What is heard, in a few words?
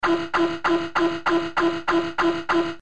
notif